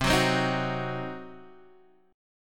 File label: C Diminished, Guitar Open G, chord